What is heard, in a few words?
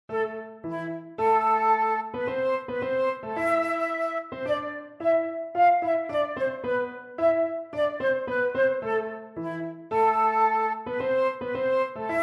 Prelude, flute